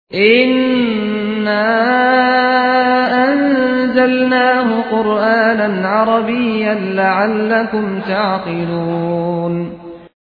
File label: Arabic Quran